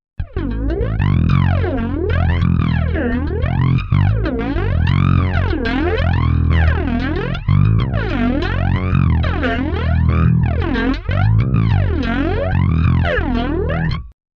Flanger; AMPWORKS

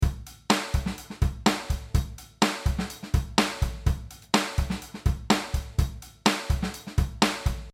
Southern Soul 125

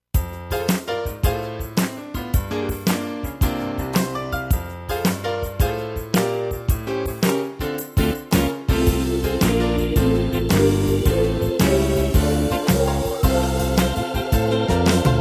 Gospel Swing; Tyros Style

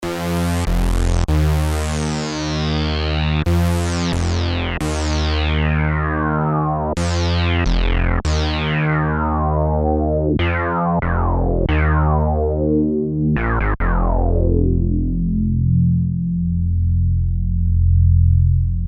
wheel bass

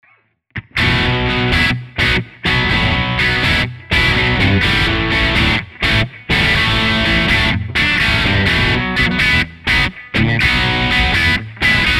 Hi gain crunch

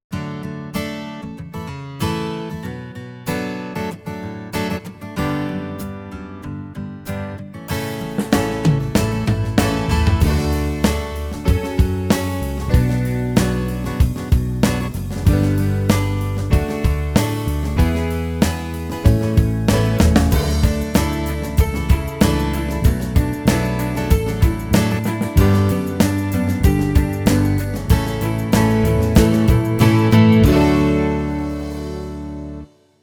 British Pop

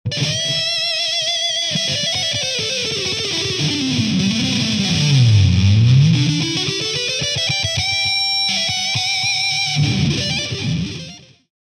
LEAD; GFX-8

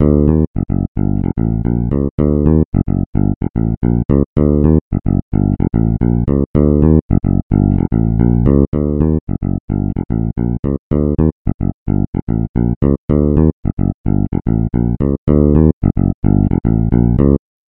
Full Fretless mf

